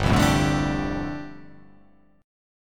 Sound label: G 7th Suspended 2nd Suspended 4th